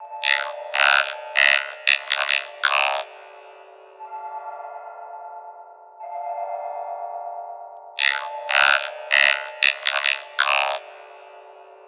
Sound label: Droid Speaks